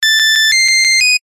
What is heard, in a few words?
technology electronic device beep tone success finished; zapsplat